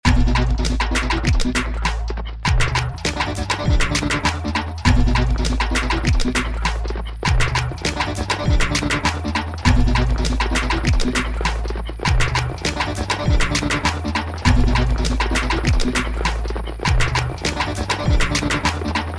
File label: beat